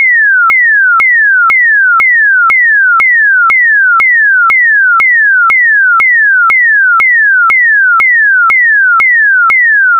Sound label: cospas sarsat locator beacon sweep down